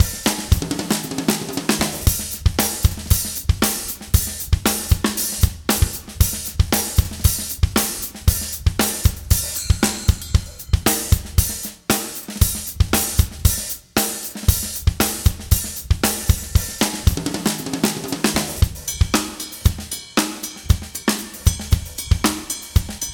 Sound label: Funk Jazz's